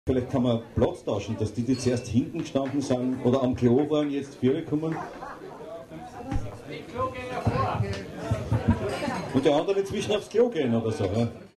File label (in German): klo